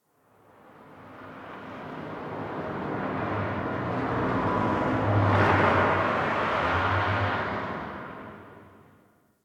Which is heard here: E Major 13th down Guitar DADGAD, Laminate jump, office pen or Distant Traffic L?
Distant Traffic L